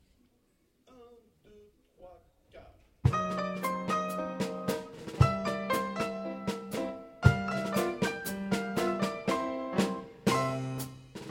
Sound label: Blackberry rag